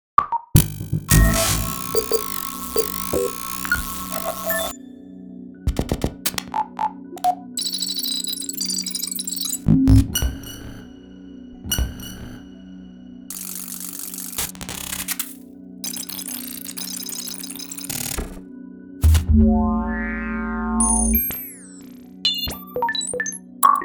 ui sounds futuristic

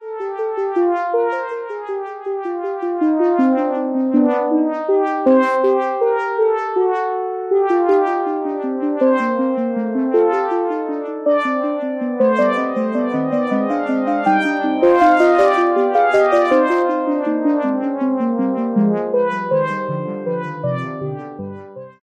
memorymoog brass

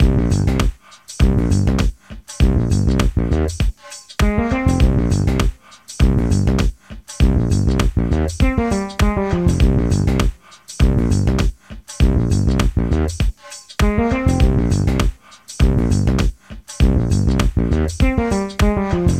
fretless; live presets

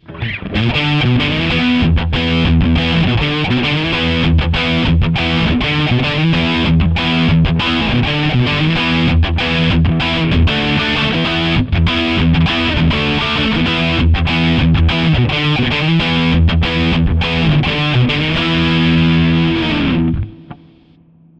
rock cab

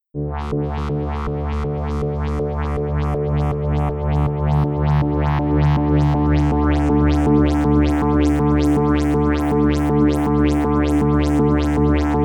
digital hologram hold key

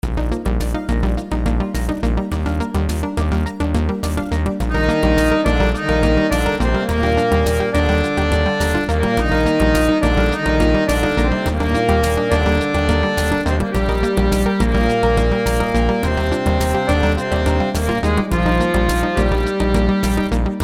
harmonium theme

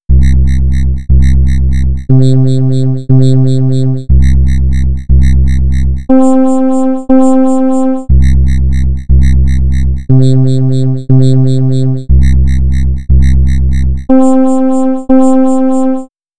programmable wavetable

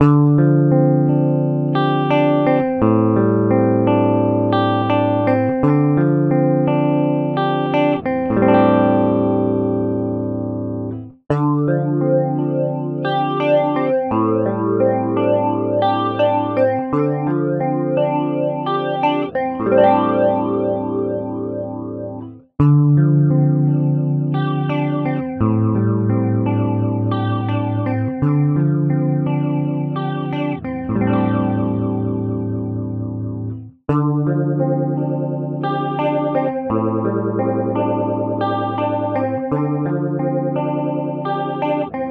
eternal on electric guitar

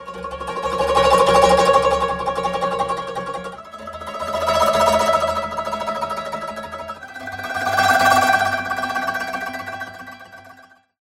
koto trill effect

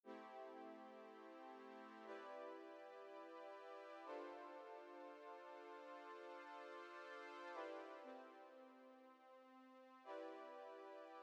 junior pad

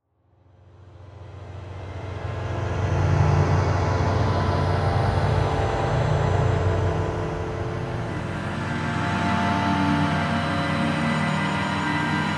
drones; forest of drones; spellbound

